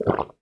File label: gulp; Food; Thalassophobia Restored